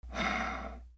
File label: OFFICE